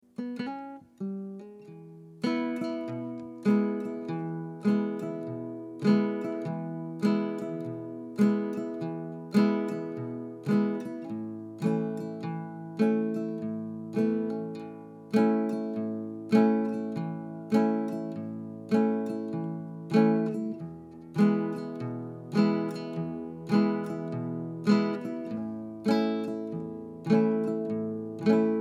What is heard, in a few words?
Familyhome Guitar; Outdoors Old